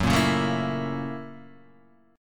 F sharp 7th Sharp 9th F sharp down Guitar Half Step Down